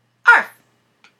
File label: arf